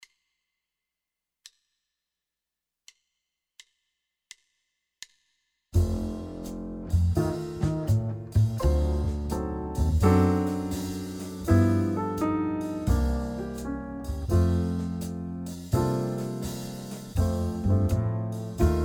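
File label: med swing winds, jazz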